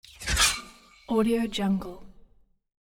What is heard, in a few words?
cryogenic chamber air release; hollywoodedge